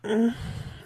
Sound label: villager mmh; naughty